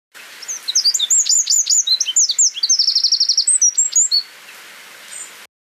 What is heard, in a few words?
winter wren